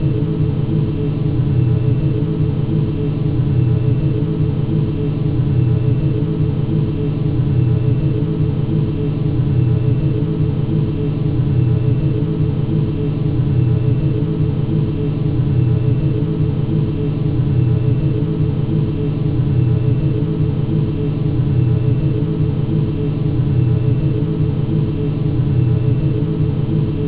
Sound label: Industrial Zone Loop